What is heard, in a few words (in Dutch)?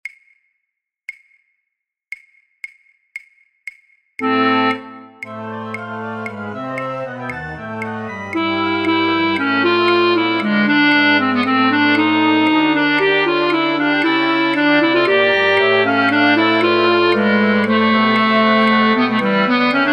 fluitorkest, Altfluit 1B1;Altfluit; voor oefentracks; Matthaus Passion